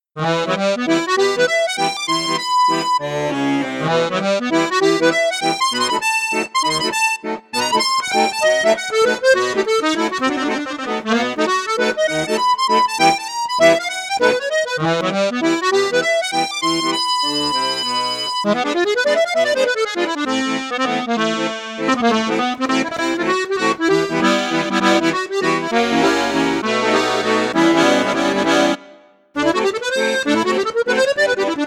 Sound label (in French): registre flute juste flute haute, accordeon; cavagnolo professionnel 12